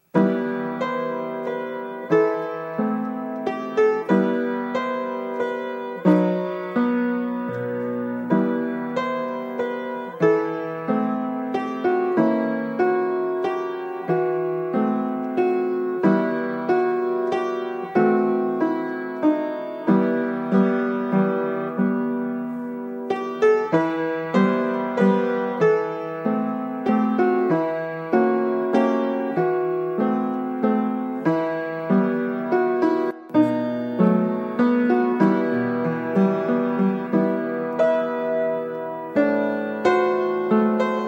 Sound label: piano